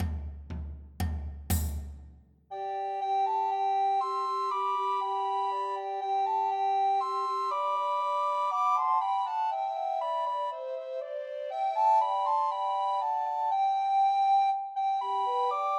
The Holly and the Ivy Christmas Trios for Two Descant and One Treble Recorder